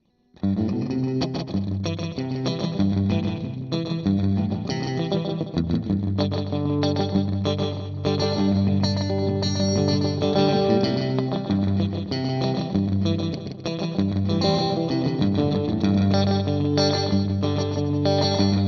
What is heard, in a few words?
slap